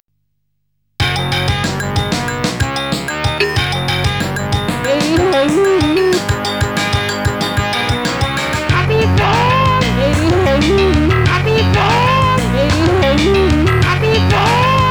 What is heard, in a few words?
kaffee funk